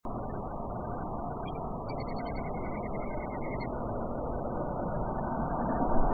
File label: Little Grebe